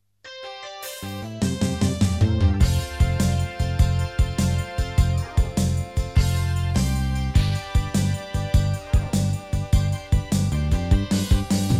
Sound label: Country Gardens melody peformance speed